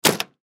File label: Fire Truck Cargo, Fire Truck Cargo Door Close; Emergency Vehicle